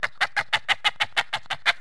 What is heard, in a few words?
Monkey; Ambient; MX vs ATV Unleashed